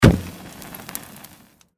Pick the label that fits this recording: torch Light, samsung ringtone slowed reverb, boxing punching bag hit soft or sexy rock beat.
torch Light